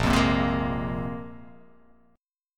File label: Bb 7th Suspended 2nd Bb down, chord; Bb7sus2; strummed; JGuitar